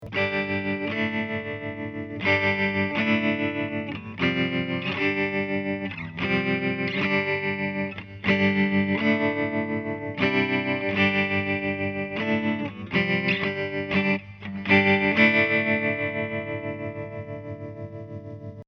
Kanal clean Tremolo

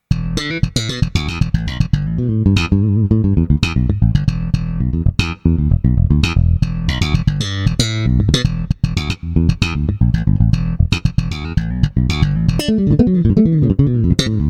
SuperNATURAL Akustik Bass

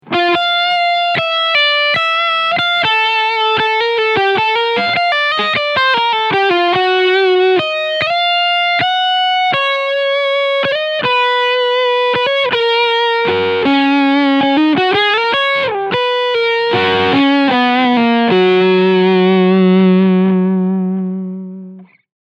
Ibanez AM93ME NT Leadsound Steg PU

